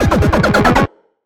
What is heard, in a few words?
LEVEL CLEAR; high hell